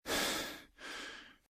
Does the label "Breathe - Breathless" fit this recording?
Yes